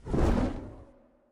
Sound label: wingflap; smite; thanatos